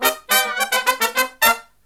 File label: Brass Riffs; USB Soundscan vol.29; AKAI